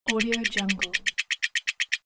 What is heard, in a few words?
clock